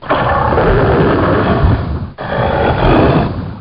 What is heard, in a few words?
Dreugh